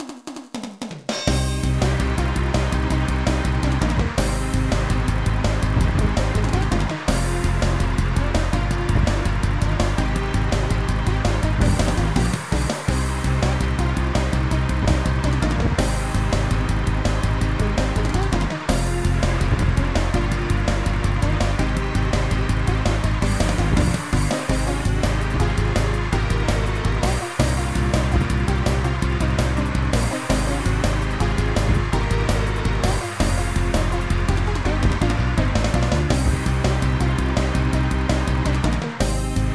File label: Burning Gong; tokimeki memorial forever with you; saturn